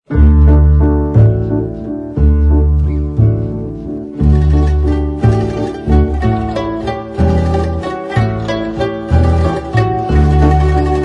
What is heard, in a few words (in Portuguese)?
Instrumentais, Barcarolle